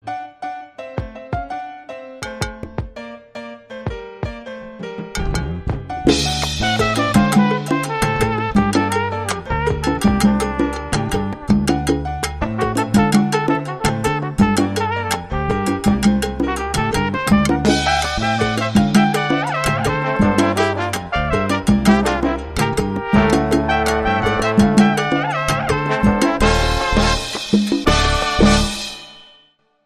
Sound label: Jazz Picadillo